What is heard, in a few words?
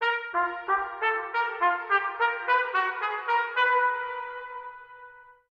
trumpet; R.B.I. Baseball 18; Android; mobile; PS4; Xbox One